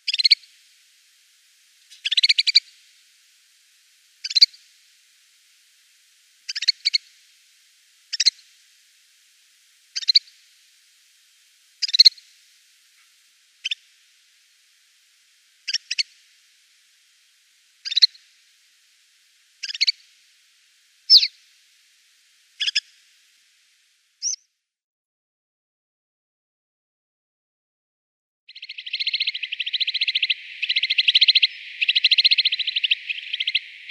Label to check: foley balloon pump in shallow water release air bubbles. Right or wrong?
Wrong